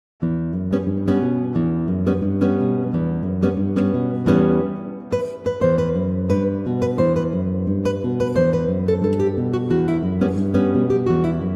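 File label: Barbers Swing, Pianoteq and Organteq; Barber of Sevilla; Rossini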